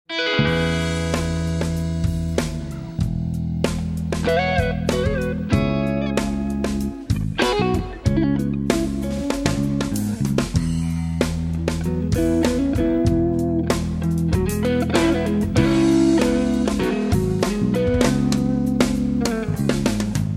Sample Stratocaster

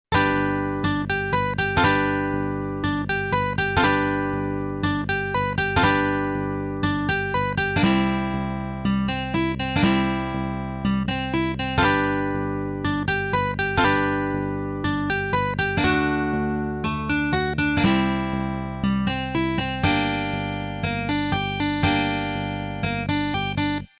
Slide in Standard Tuning G

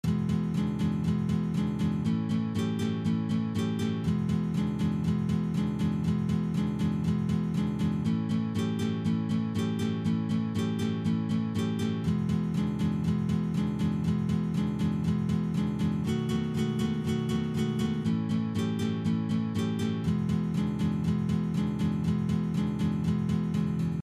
Strumming 12 Bar Blues

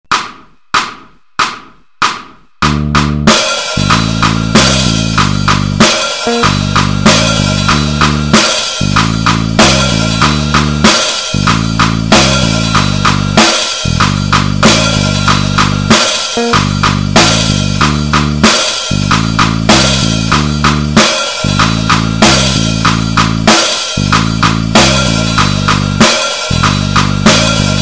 Style Solo BT

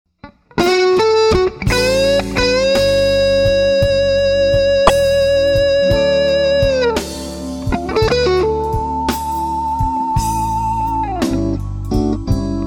Sample Les Paul

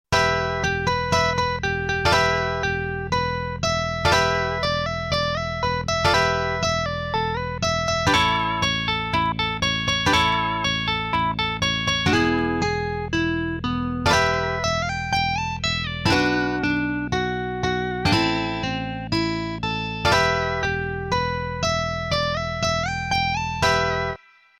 Slide in Standard Tuning E

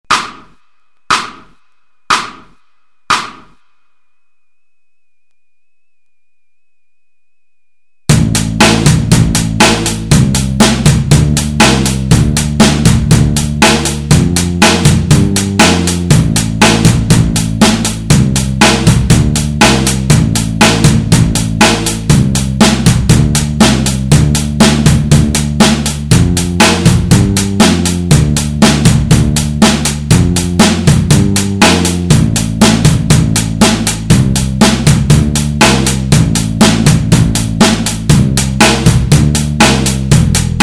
Power Blues

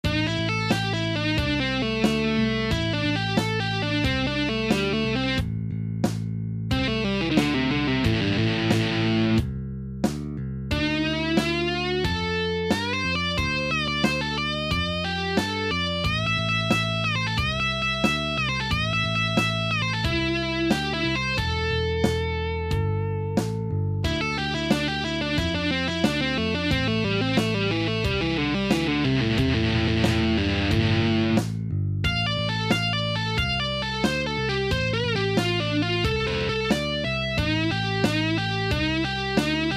Pentatonic Licks

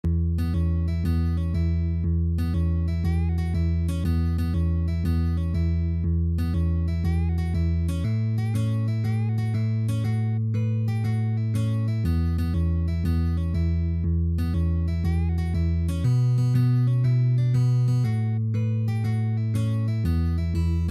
Easy Acoustic Blues

